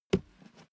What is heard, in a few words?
step barefoot metal single left